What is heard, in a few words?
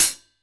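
MIX closed hi-hat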